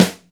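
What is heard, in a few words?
snare drum neutral; SNAREDRUMS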